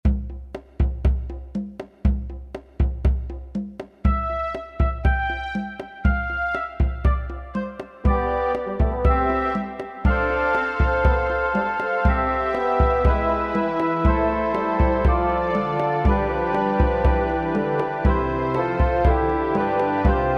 Baroque Tambor

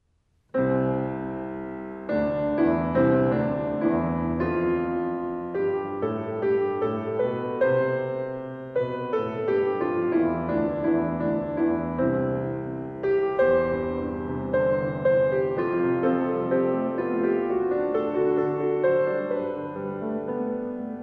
Piano Improvisation

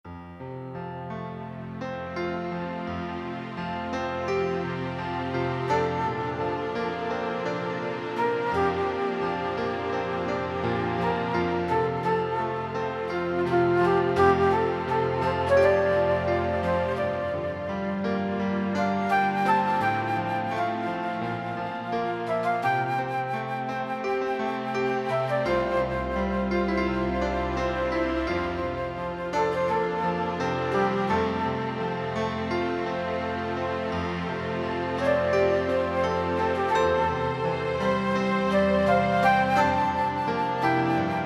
Romance For Flute

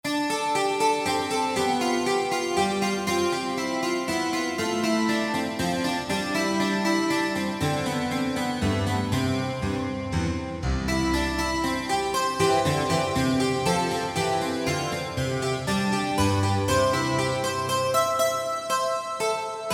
Baroque Dance

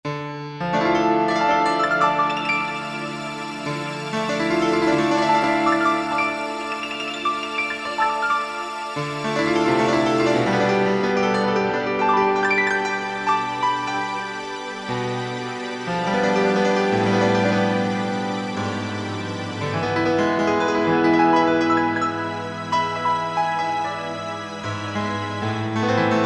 Piano Improvisation

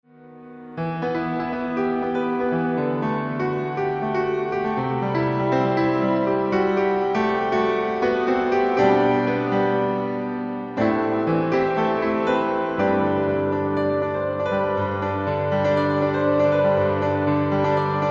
Piano Intermezzo

